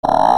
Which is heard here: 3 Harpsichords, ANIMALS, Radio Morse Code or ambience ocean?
ANIMALS